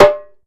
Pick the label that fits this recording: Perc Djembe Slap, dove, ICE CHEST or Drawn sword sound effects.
Perc Djembe Slap